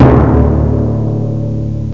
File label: KETTLEDR, BAS PERC; Groovy Bytes: Behind the Moon